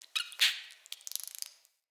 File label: Messenger Rat Chatter C; Ambient; Vocals; Verminia; Animals